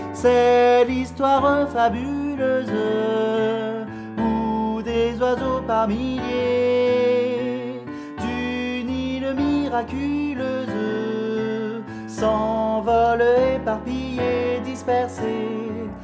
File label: Soprano